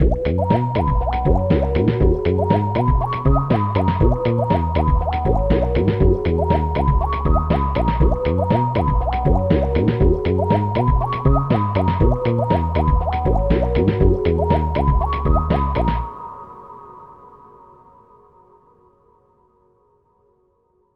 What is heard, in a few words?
water wave house